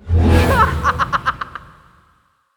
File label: spell cast; malicia